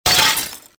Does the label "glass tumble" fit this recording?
Yes